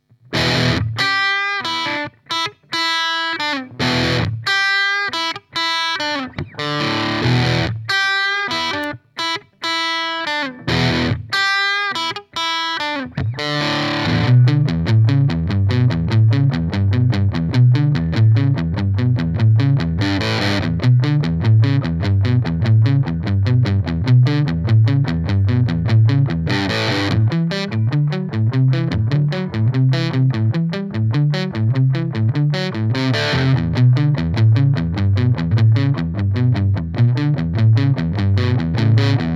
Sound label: LP Crunch; lampes